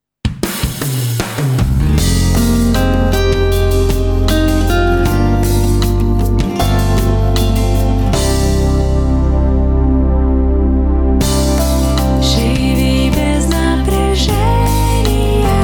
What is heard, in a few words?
radio jingle; ru-maestro